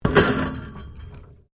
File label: MECHANICAL CLANK RUBBER METAL; Variou smechanical sounds; Clanks; Objects